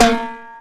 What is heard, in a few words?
Timbale, Hip-Hop, Drum Kit